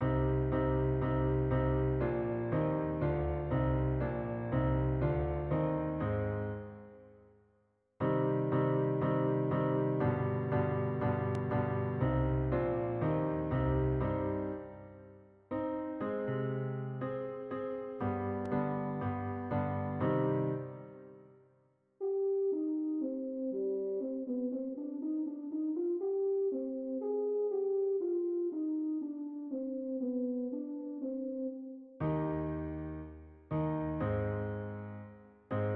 horn song

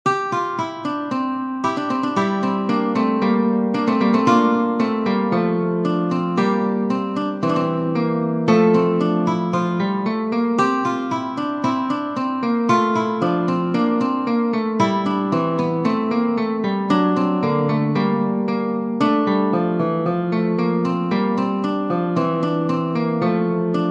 choral prelude for guitar duet; Buxtehude, Dieterich; Jesus Christus unser Heiland

